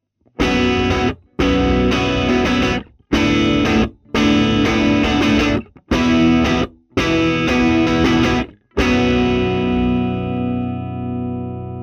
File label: Guitar Box - C12 Bi Direct; bidirectional (osmička); edge duo srovnavaci test